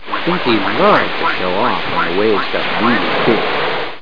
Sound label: duck